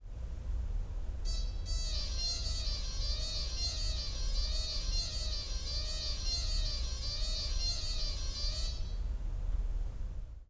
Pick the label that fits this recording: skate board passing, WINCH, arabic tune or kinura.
kinura